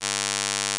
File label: Pulse train